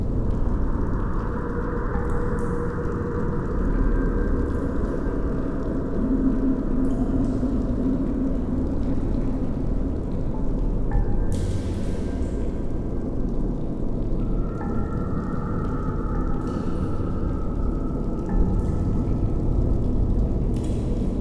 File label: Cave Background Loop; Warframe; Ambience; Grineer; Grn Boss Room1